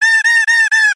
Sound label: cartoon dolphin laugh